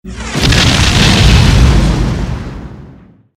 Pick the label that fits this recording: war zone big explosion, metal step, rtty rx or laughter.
war zone big explosion